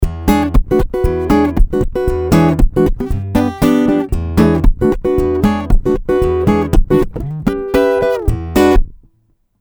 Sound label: Woodland Finger Bass Mitten angehoben